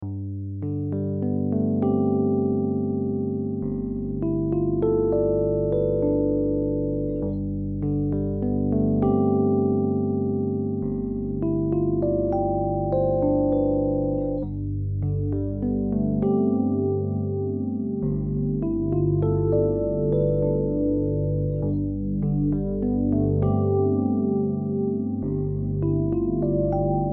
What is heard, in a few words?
Chorus; Mikron Chorus